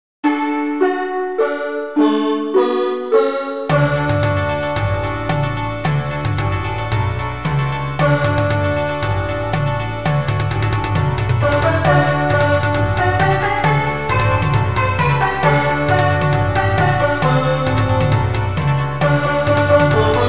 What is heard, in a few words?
tabla and pakavaj